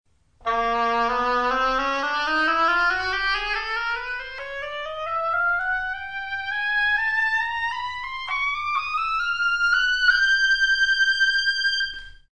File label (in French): hautbois